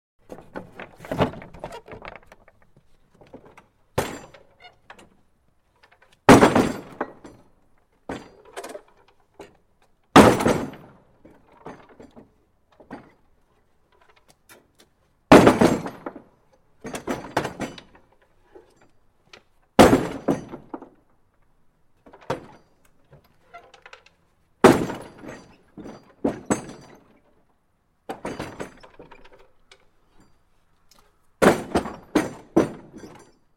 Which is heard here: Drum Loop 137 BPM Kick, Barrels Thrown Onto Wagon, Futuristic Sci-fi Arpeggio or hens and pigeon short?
Barrels Thrown Onto Wagon